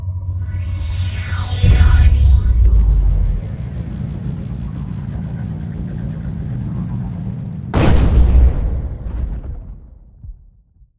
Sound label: megastructure gate way station death; apocalypse